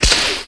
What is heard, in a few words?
Open